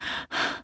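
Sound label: Realistic Fatigue Voice, female; oblivion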